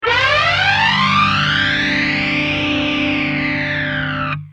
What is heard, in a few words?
gtr mxr fd